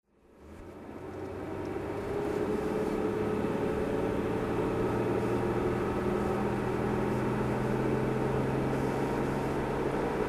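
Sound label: jaguar XJC 42 4aut driving, Engine sound driving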